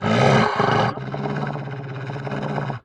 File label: growl, dog